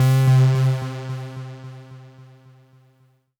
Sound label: Synth C, Synth Prophet One Shots; Electronisounds